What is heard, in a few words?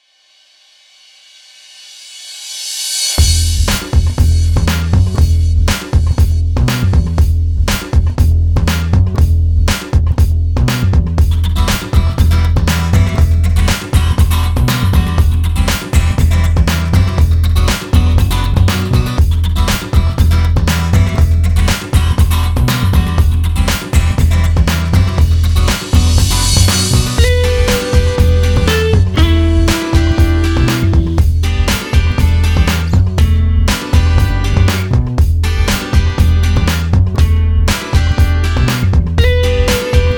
Guitar Groove